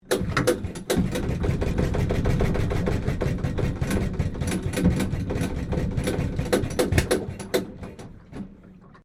Boat Small Engine Inboard Start Fail Stereo, Inboard boat engine running badly; Vehicles